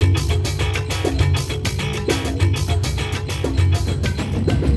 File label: Ambi Groove